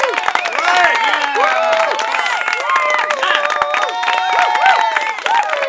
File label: Small Crowd Clapping; RCXtreme; Environment